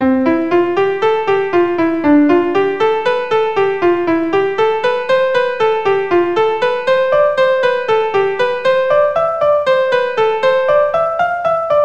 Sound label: 5 Finger RH